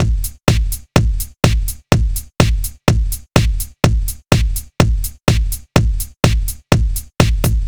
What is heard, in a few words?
Displosion